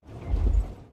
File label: soft road bump; Blind Drive